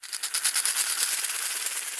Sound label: Caxixi FX; Latin Percussion; Drums; Drum Hits; Shaker; Ableton; Factory Packs